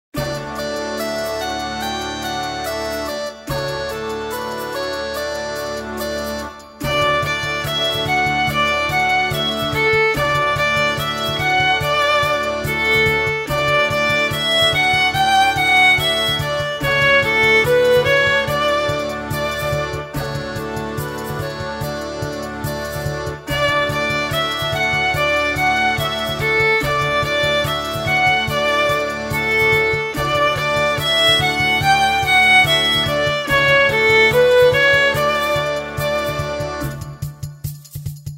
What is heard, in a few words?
Flute and Drummer